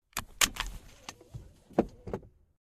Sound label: unbuckle